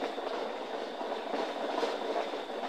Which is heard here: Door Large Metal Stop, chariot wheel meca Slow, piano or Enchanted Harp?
chariot wheel meca Slow